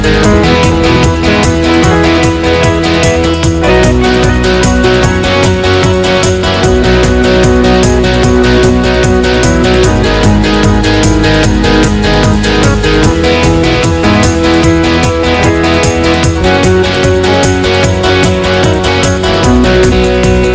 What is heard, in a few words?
On Fire and Fast